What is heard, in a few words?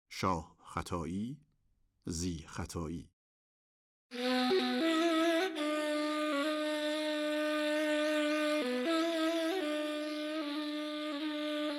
Shah khataie Zi khataie Segah, Ney; Radif-e Ney; Dastgah Segah